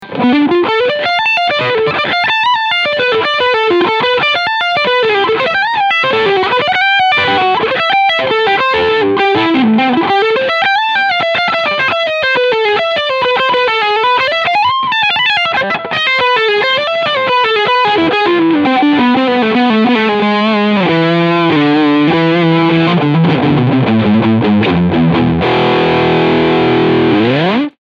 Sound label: Jackson Audio Golden Boy Drive Drive Blue Boost